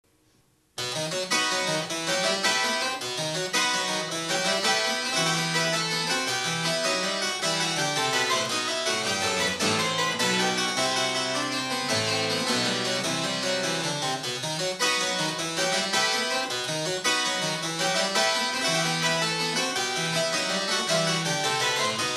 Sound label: harpsicord